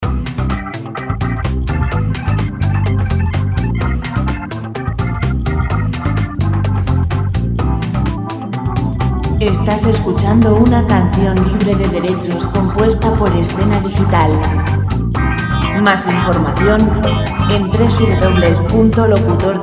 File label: 127 chill; chillout; Música para audiovisuales